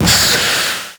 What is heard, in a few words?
Proc Gas; Warframe; Mods